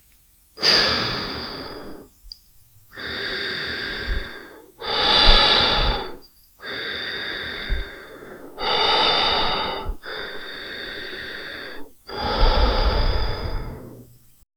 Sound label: slowly breathe out throug, ambient